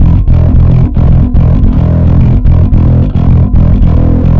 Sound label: Bass, Distorted E; Bass X